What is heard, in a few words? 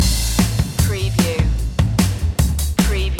bikers blues loop